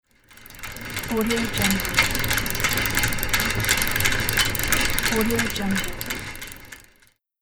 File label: bicycle chain